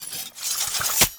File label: Idle Sword Twirl; Arthur Hub; Nineteen Ninety Nine